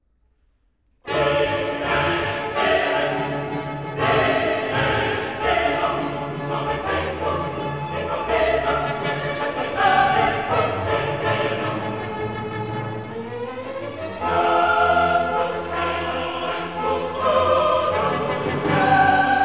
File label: Requiem for soloists, chorus, and orchestra, Dies irae; K. 626; 3. Sequentia: 1.; Mozart